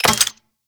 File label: Flame Spear Charge